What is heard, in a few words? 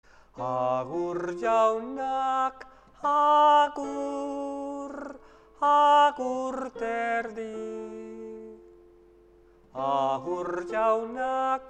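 tenor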